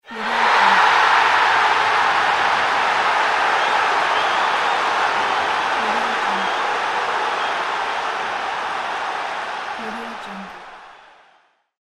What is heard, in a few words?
football fans cheer a goal